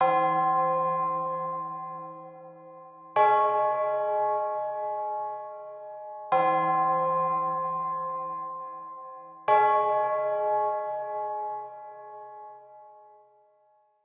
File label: bells; schattenlander